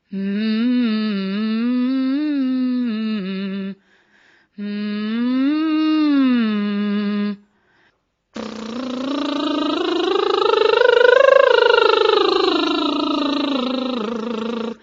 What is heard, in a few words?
vocals